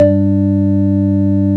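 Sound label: organ G2; Best Service; Advanced Media Trax Modern Composer; DANCE ORG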